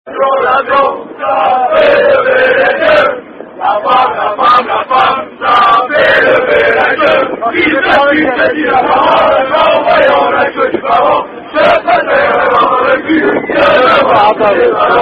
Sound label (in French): Chant Rugby, French Rugby Song